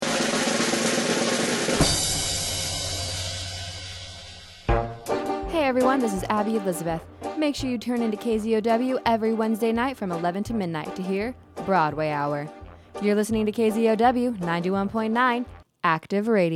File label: bump; Broadway Hour